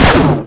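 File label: blaster fire, weapons; lmctf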